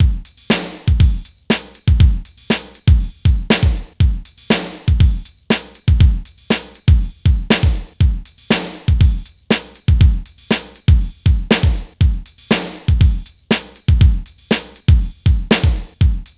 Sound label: FUNK, Funky; INIS; Funky Xtreams